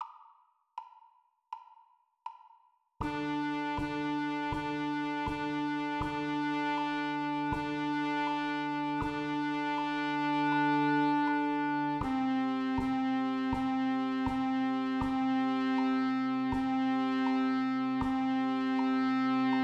Oppvarming lyd alle, skolekorps

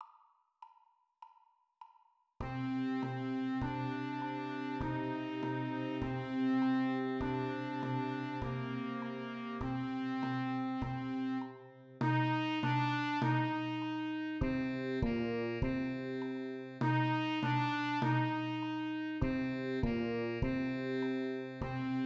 marsj lyd alle, skolekorps

